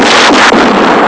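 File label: explosion; Amiga Soundtracker Sample Packs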